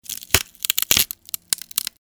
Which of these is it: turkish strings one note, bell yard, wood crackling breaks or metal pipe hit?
wood crackling breaks